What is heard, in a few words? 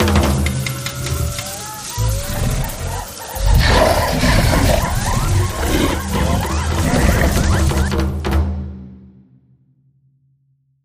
nearmiss; Wild Ape